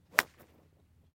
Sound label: golf club flub